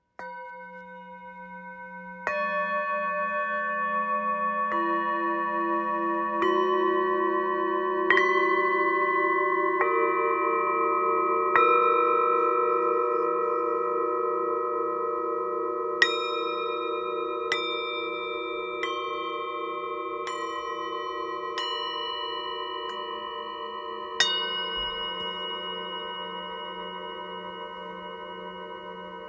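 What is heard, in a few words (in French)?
Set, bols chantants tibétains; orissa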